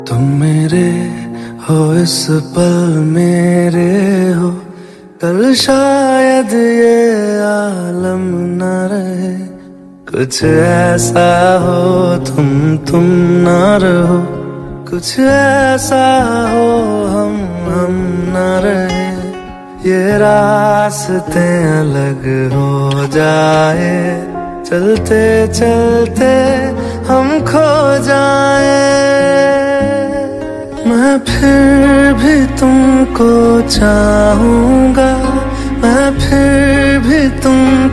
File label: Breakup Song Ringtone